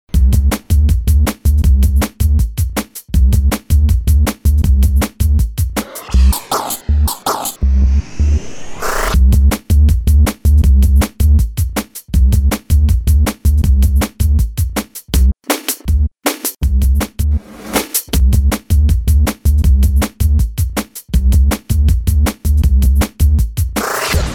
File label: bass; bed